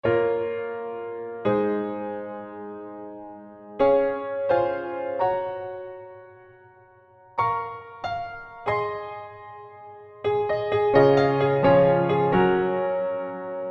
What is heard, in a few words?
Acoustic; ENGLISH